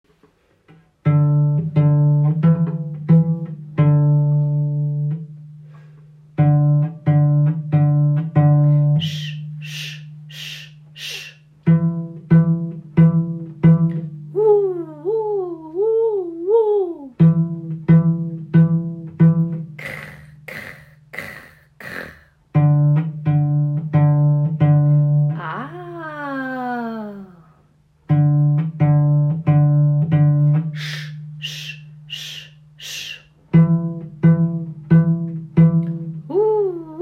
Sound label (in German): pizzicato, Cello